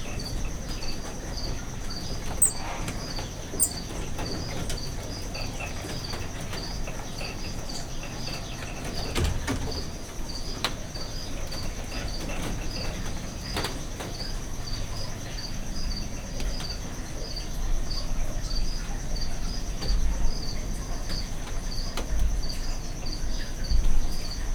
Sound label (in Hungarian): ketrecükben mászkálnak terrárium, lisztmajmocska; Győri Zoo 2014